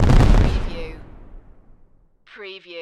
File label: Fireworks Short Burst